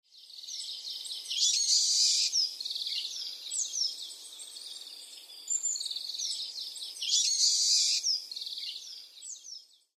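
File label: brambling, hokuto; atori; koe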